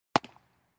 Baseball Mitt Impact

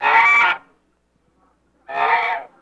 Goose African Calls

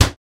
Cabinet Door Slam Di

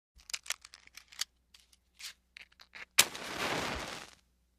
Sngl Match Strike; lighters & matches

